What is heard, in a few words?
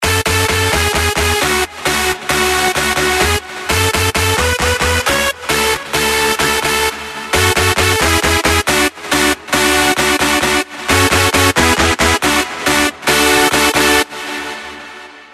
CLASSIC LEAD